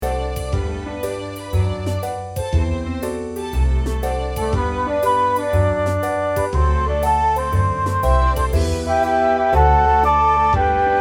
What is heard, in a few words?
Swingy Jazz